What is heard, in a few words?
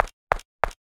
footsteps; adarkroom